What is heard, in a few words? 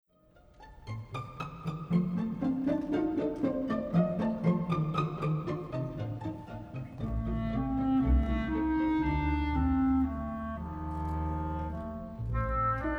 orchestral